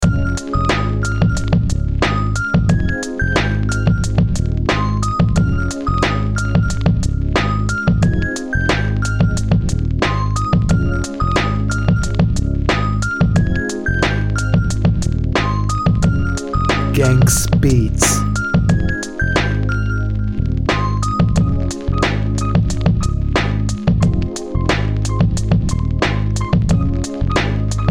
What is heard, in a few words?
happy hiphop rap beat